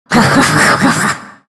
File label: broo laugh; Dota 2; broodmother